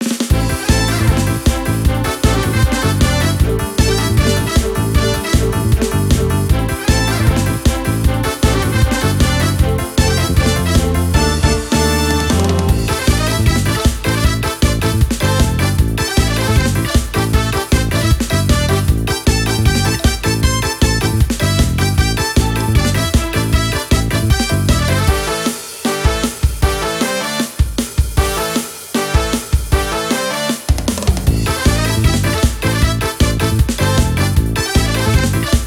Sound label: BGM FISHPOND LP; Bakutsuri Bar Hunter; 3DS